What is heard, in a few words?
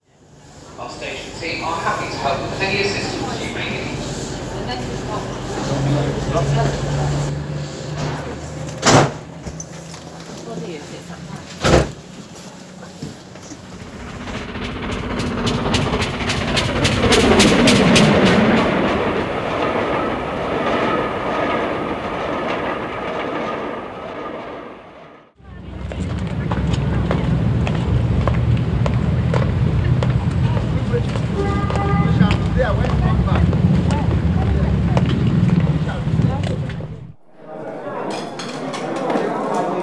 City Break Soundscape; Holidays